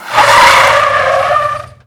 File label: tire skid cement short